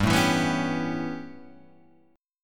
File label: A 9th G, Guitar Standard